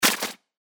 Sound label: FX Hat, cymbals; revealed cymbals vol 1